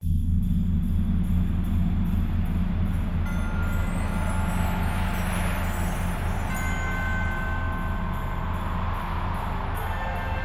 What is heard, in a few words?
Alphorn; Sonokinetic